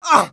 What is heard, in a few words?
damage; mohaa; dialogue